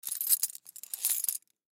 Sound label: Key Ring Attach, Key Ring Attach Carabineer; foley; Latch Sound Effects; Objects; Blastwave